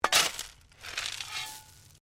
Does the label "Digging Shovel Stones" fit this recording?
Yes